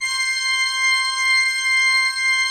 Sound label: C6, cathedral organ; Propeller Island